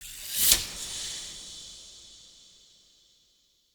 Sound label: UI Skill Points